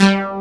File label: FATTY BS, DANCE; Pro-Rec - Synthsonic; Z1 DANCE